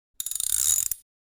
Socket wrench clicking turning

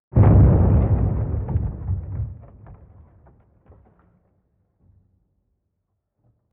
Wood impact low explosion sweetener

